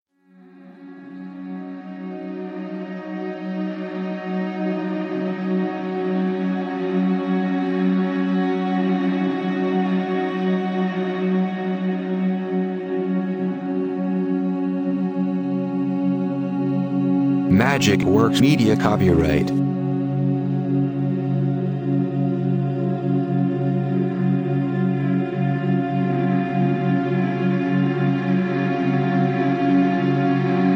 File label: spa vibes; JAZZ